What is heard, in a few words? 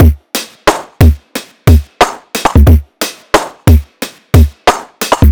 Snare